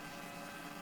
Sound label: Ghost Device Hum